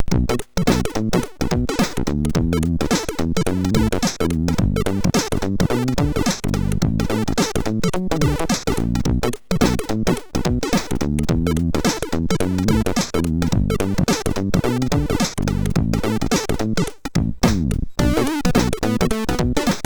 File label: MOS6581; MOS6581R4